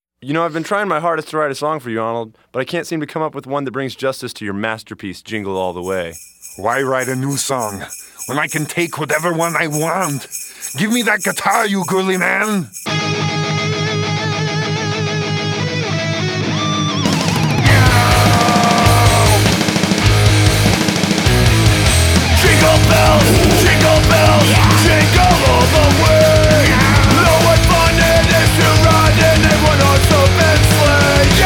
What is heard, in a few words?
jingle bells